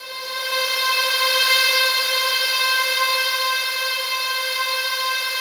SLOW STRINGS

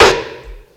rimshot, Ragga & Dub Reggae; USB Soundscan vol.27; AKAI

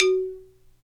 XYLO, Afro Xylophn

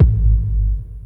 BD, Dance Mega Drums; Best Service

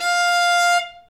nyckelharpa F 5; SoundBytes - Early Patches

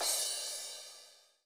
Md Crash, Alesis SR16

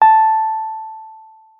A5, WURLITZER; Xsample VOL-1; 90 s Sample CDs; WURLITZER B

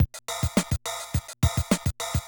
Loop, classic machines, rpm 40

